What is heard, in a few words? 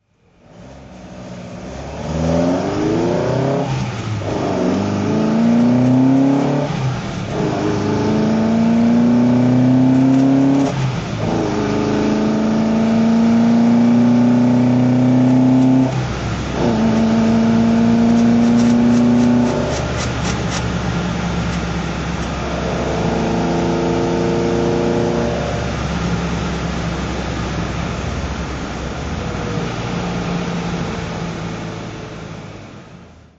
alfa romeo giulia 1600 sprint drive